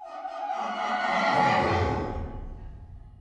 horse neigh; ambience; dfcaverns

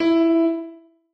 noteblock e; mesecons; nalc server

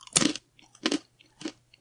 eat generic; hbhunger

